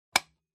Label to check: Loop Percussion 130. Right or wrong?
Wrong